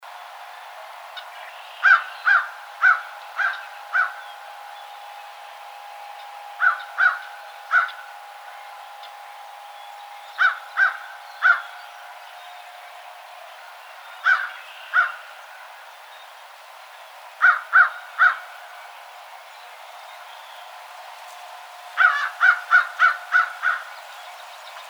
American Crow

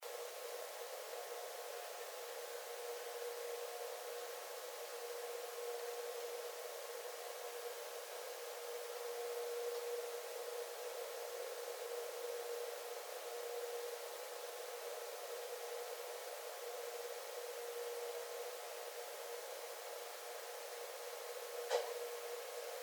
Red Fox

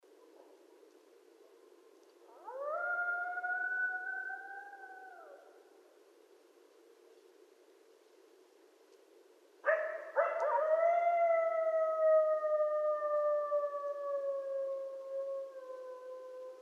Coyotes; Coyotes (Canis latrans)

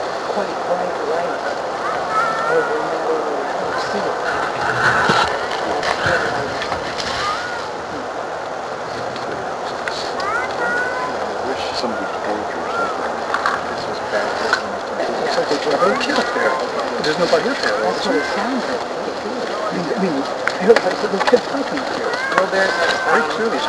cry baby

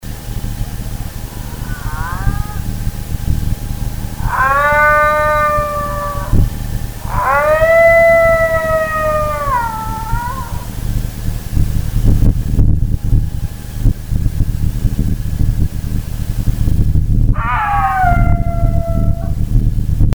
Illinois Howl unfiltered

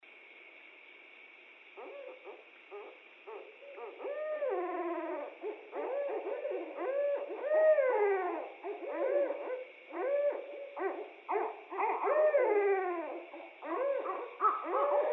Barred Owl

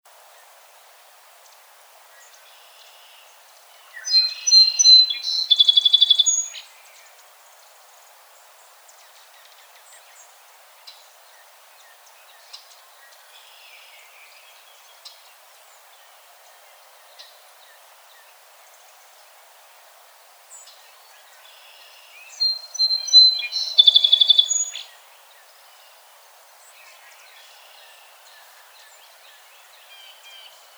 Song Sparrow

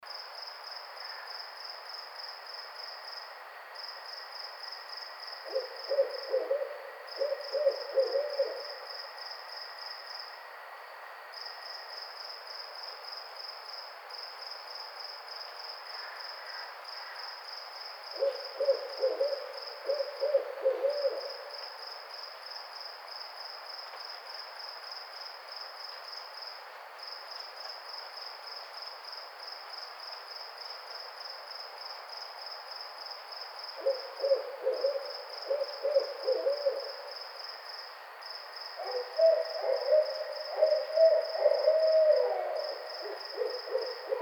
Barred Owl

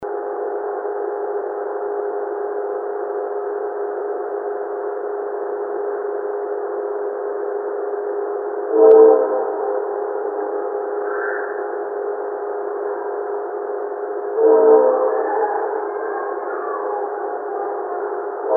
voice, bigfoot

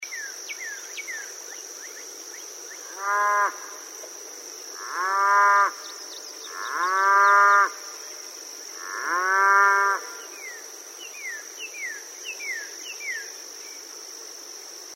Cattle

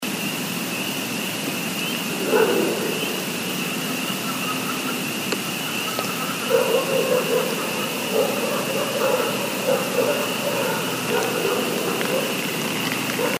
Growl

